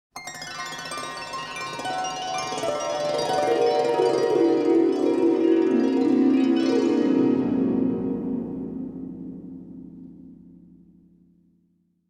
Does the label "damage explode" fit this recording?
No